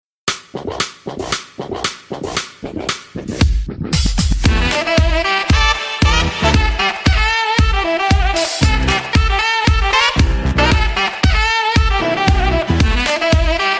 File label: Retro Funky remix